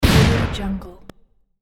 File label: shotgun blast single interior dry